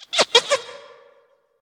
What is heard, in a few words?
Monkey